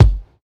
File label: low tone bass drum g key, Royality free kickdrum tuned to the G note. Loudest frequency: 237Hz